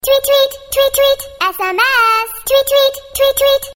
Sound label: beautifull voice sms; alternative